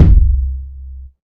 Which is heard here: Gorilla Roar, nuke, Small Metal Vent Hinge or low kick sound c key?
low kick sound c key